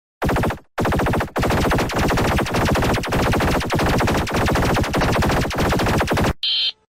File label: eas alarm; saint martin